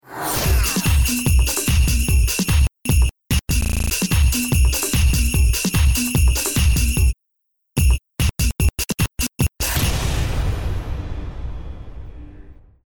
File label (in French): Base rythmé